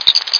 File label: chirp